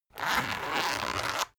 zipper run long slow

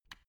switch turn off soft

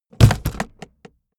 aluminium case hit hard

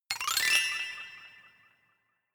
notification glockenspiel sparkle slide up

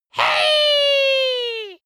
dwarf cheer "hey"

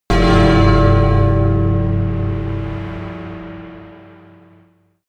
melodic church bell tamtam low

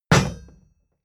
war hammer put down hard

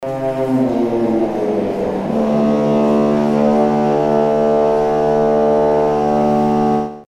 pipe organ fx

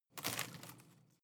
home plant leaves rustle short

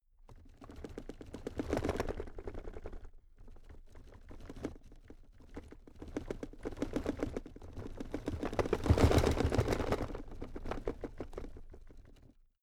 drawer cabinet stuff rattle

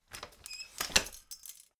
folding chair fold fast

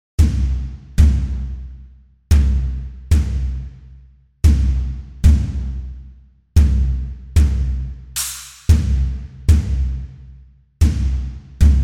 asian drum groove

